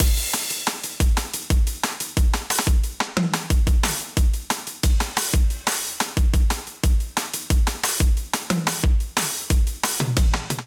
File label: Kit 90 Bpm, Drums; MIDI Clips; Atlas; Ableton; Factory Packs; Vibrations; ModeAudio